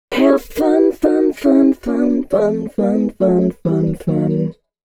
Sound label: female c#, Dance Ultra Voice FX; Mutekki Media